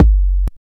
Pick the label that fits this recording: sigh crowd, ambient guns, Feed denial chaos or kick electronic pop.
kick electronic pop